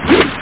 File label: woodhit; intgolf